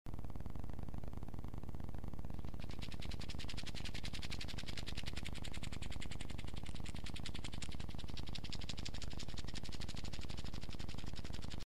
zvuk beg myshi v kolese; myshey

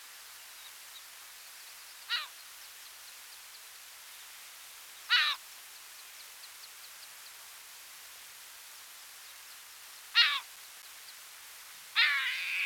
klusha larus fuscus, zvuki chaek

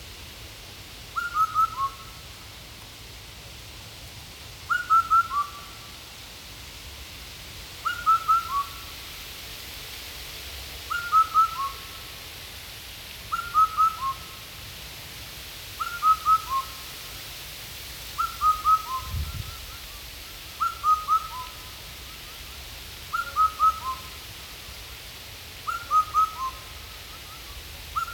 indiyskaya kukushka cuculus micropterus, zvuki kukushki

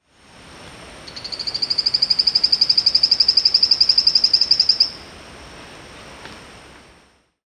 allobates insperatus, zvuki lyagushek i zhab

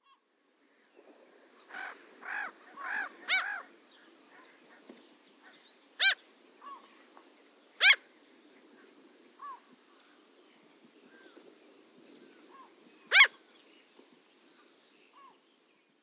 sizaya chayka larus canus canus

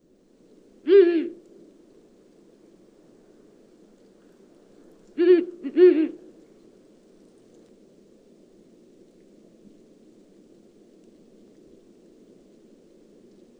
dlinnohvostaya neyasyt strix uralensis, zvuki nochnyh ptits

